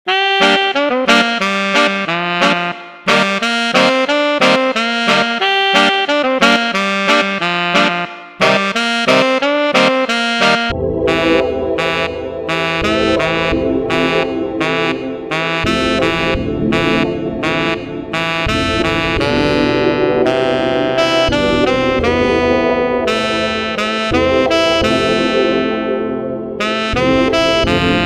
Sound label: Bass loops